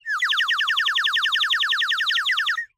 Bird whistle, clay, painted, water, airy, wispy, phrase, excited, happy, rapid, long; Eiravaein Works; Vaeyan IV